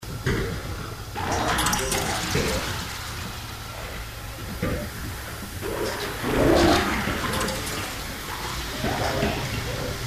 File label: haunted machinery